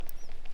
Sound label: whistle